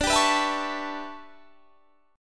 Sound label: E Minor 13th D